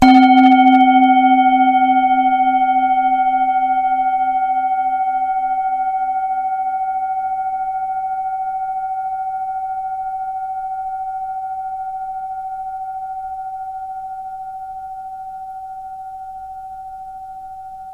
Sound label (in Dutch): klankschaal kaschmir 16cm